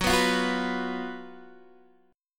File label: F sharp Major 7th Suspended 4th, Guitar, DADGAD